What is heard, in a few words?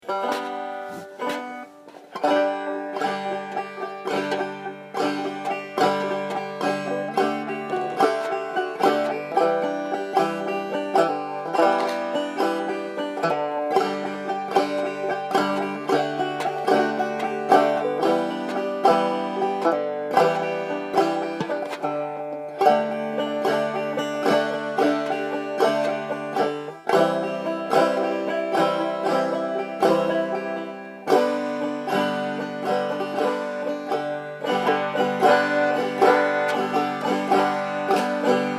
chording